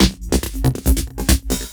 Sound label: Rolling Pattern, Drums Beats